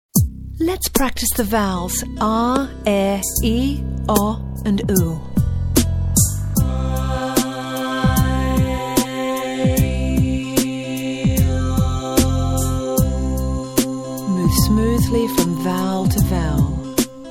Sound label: vowel exercise